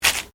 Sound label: ORGANIC TEAR DAMP, Various Organic Foley sounds; Blood and Guts; Horror